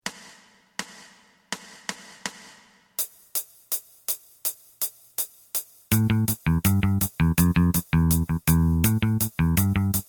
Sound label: drum